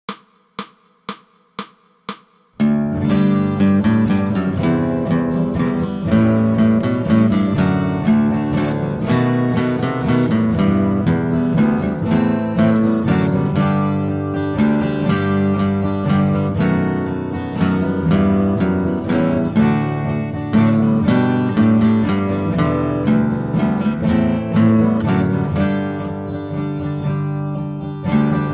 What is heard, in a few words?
Bass Solo; We Wish You a Merry Christmas (bass solo)